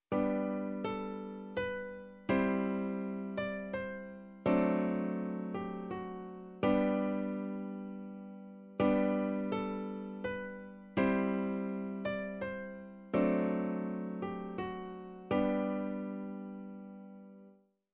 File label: Strum Chord; Grade 5